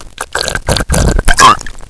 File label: gurp; bong